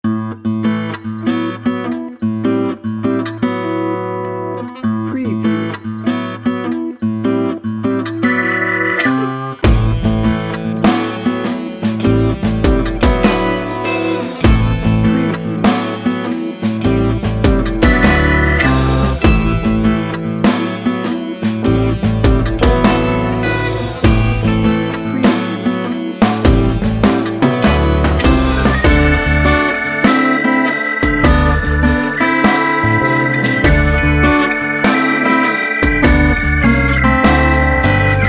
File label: blue collar blues bed